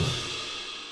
OH

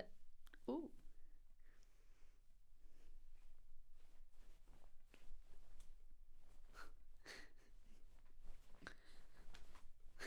Vox

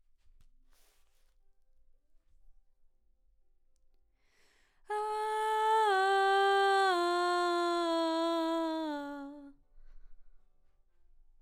BG Vox

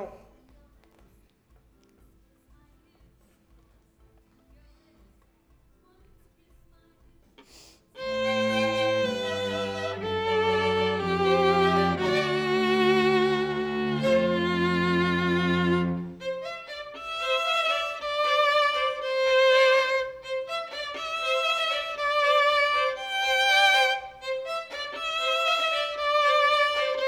violin

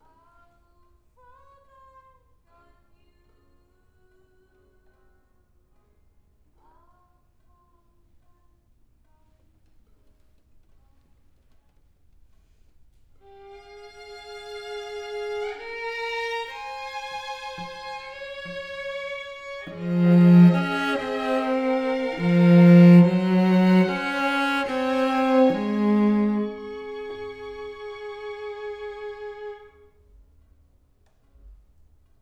Cello

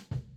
Rack

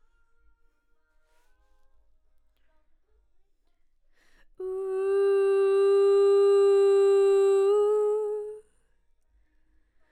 BG Vox

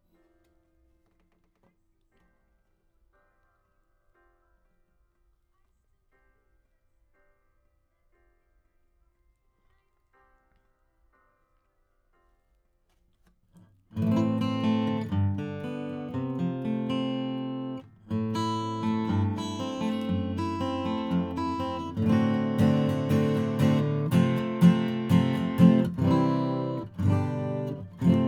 AC GTR

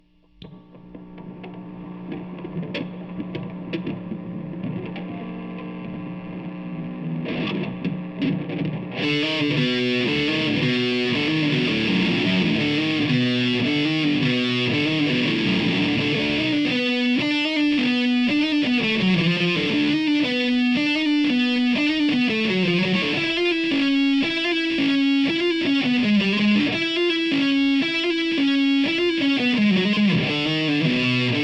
GTR

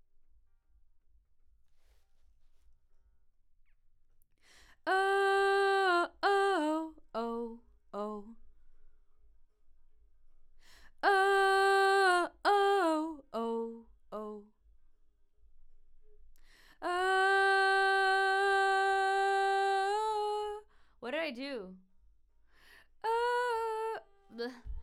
Vox start new

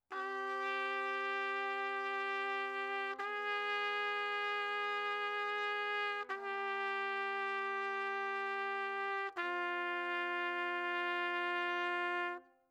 Trumpet

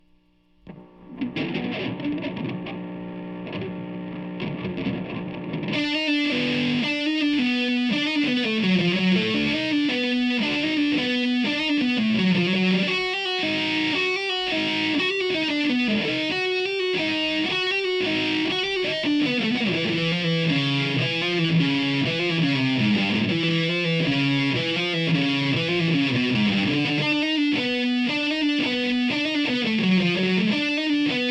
GTR

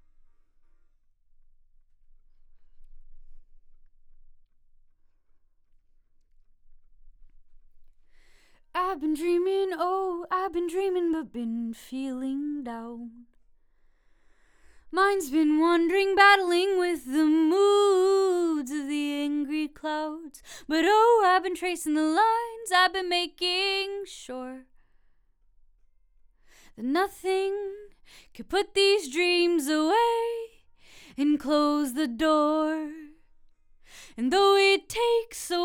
Vox start new

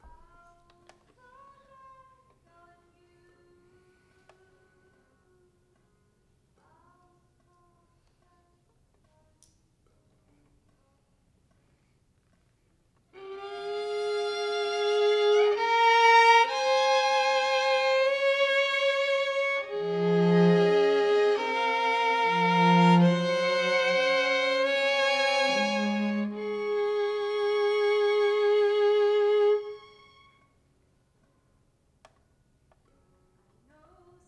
violin

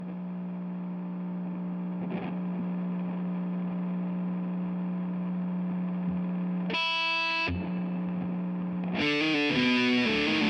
GTR; GTR RE20

